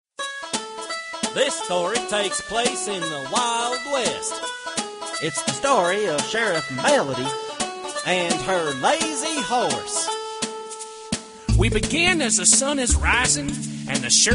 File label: melody